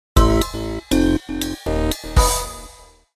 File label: Lose; Moonlight Mansion; Mini Mario & Friends: amiibo Challenge